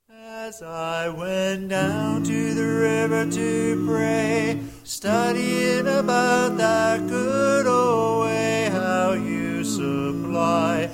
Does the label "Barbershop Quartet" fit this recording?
Yes